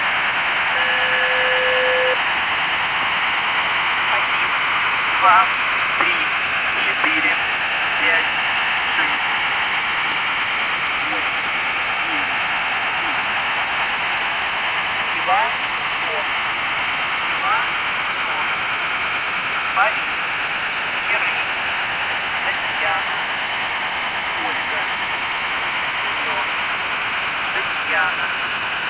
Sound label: airhorn